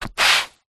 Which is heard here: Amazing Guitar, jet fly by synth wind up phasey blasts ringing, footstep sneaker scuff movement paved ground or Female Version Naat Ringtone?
footstep sneaker scuff movement paved ground